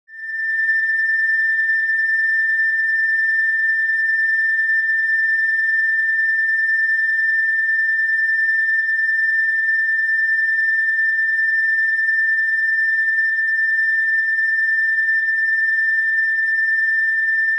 Pulsating high frequency electronic signal; radio signals

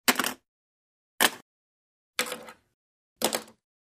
Throw a ballpoint pen on the table

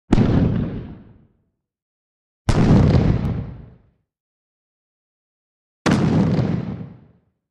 Grenade explosion; explosions

